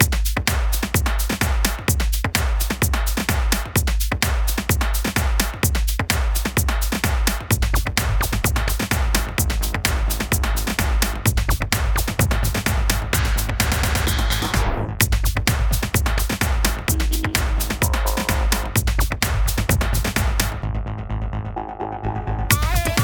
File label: Just a simple drum loop